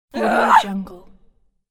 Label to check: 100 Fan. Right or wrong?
Wrong